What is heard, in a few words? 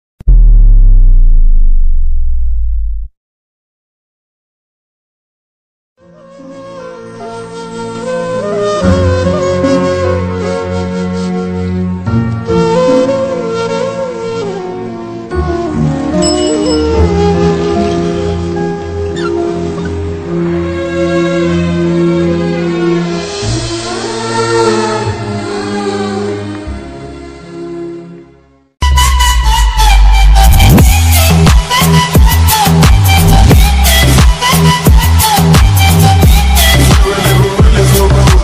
love ringtone, kannada; ambari kannada movie